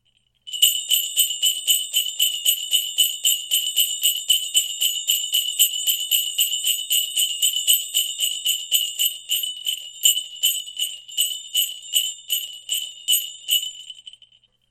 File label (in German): kugeln, schellen